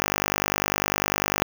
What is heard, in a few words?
125bpm 5-4 1bar; MidroSync